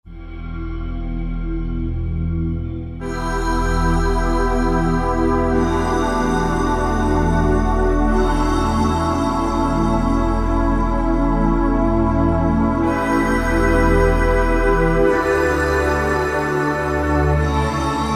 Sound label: Bell-Ringer, Roland JD-990; Factory Patches